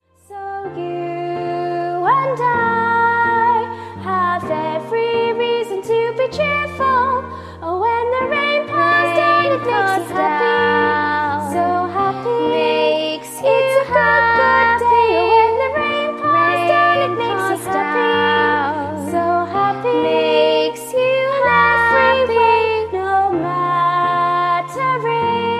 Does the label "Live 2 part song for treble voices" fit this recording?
Yes